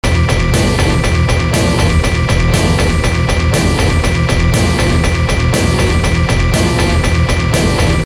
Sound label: loop